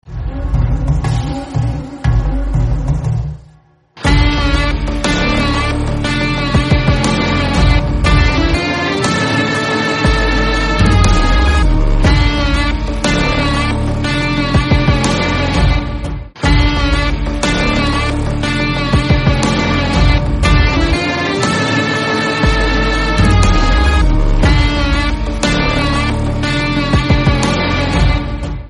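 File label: tamil movie background music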